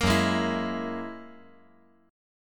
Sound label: Gb 9th Gb down 5G,4C,5E,5A